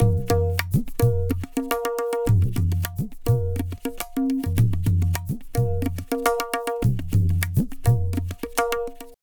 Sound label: Tabla, 105 BPM; Pure Tabla; Zero-G